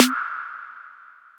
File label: rich top end acoustic snare b key